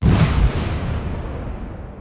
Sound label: explosion; star explorer solar2d game